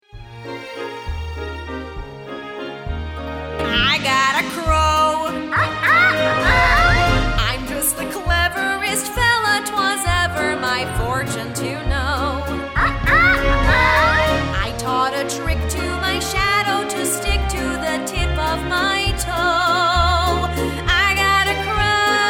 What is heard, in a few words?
Audition Song Vocal Guide; Peter Pan